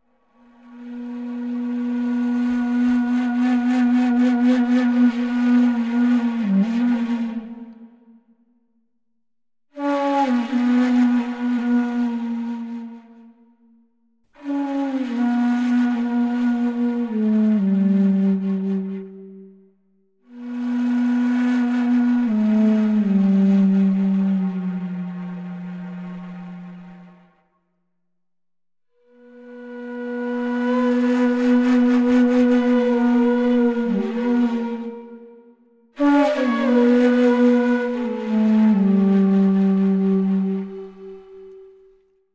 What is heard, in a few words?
SCALES ending